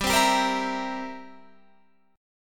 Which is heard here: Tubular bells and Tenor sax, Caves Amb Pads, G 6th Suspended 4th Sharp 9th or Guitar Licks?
G 6th Suspended 4th Sharp 9th